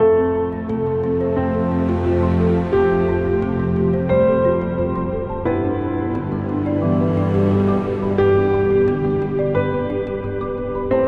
Space Soundscape; dramatic

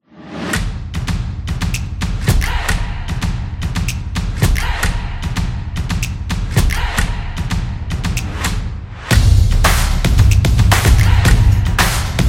Energetic Upbeat; high energy

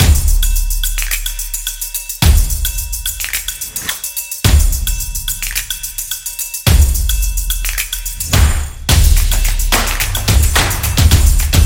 This Is Drumming Stomping Clapping; football drums

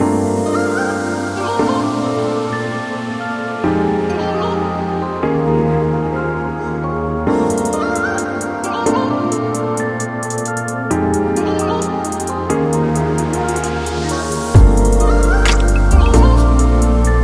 Chill

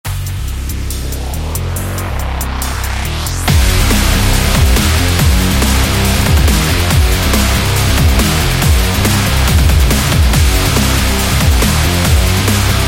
Dubstep Rock; electro rock

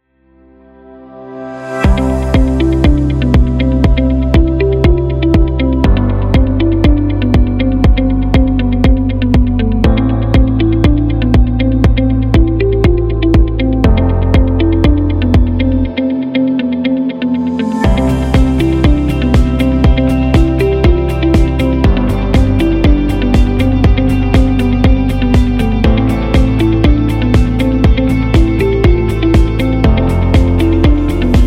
Business Background Music

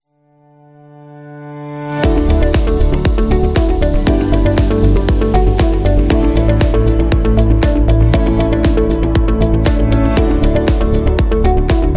Success Background; corporate music vol 20

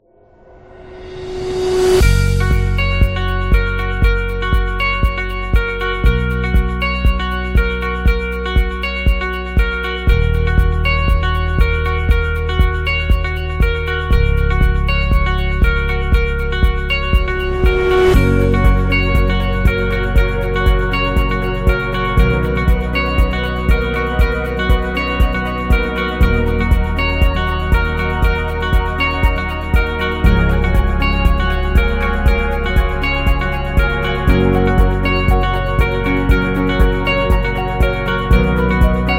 Inspirational Background; corporate music vol 2

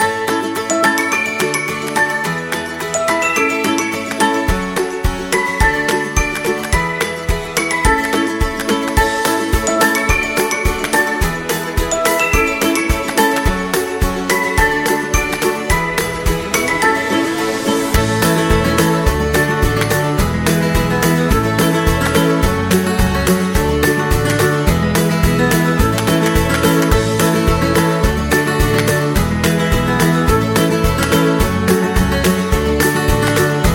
In Folk, happy music; happy music vol 8

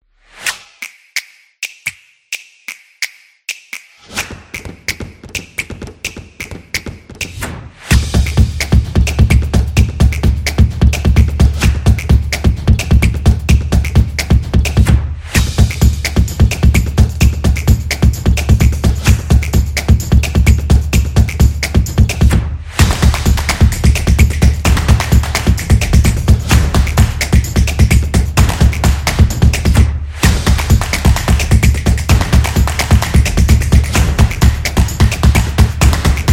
Drum Promo; casual game

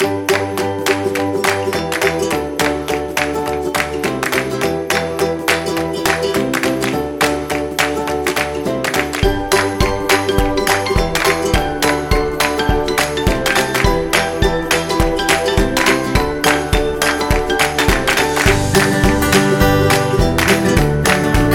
Upbeat Holiday, happy music

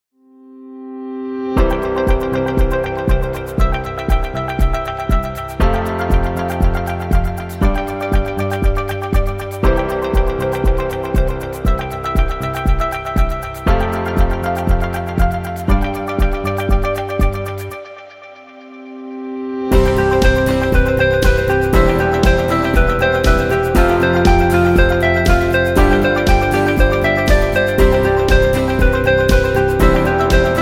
Corporate Dream; corporate music vol 1

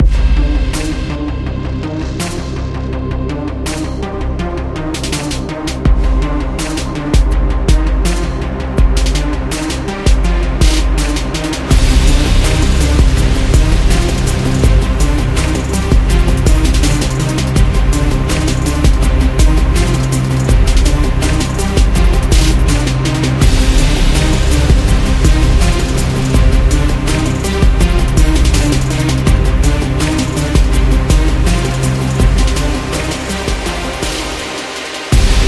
Hip Hop Trailer

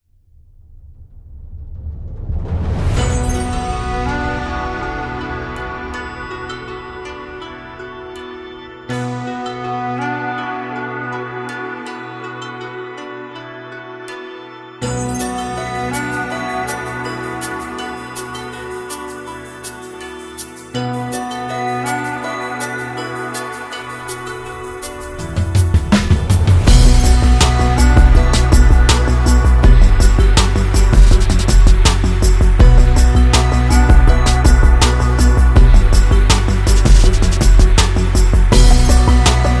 China Travel

